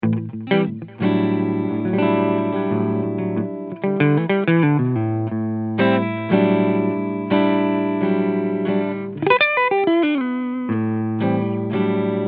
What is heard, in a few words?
PRS SE McCarty 594 Vintage SB Clean Sound Neck Humbucker